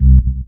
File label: ORG BAS; E-Lab; X Static Goldmine 2; akai